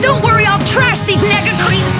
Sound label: trash